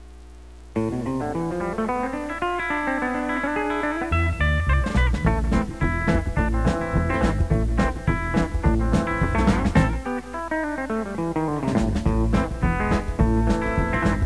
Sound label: Pickin